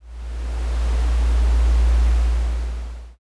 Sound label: planet ambient